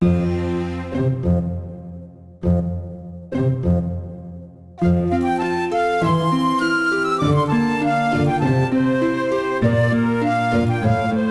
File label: Multi match music